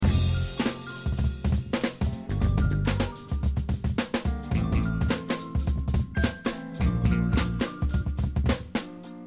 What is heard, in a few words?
loops